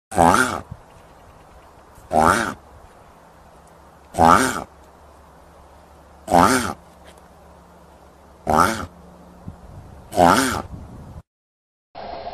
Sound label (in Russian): cherepaha